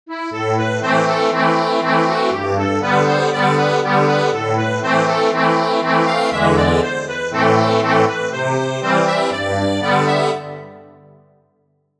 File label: Scotch Reel ACCORDION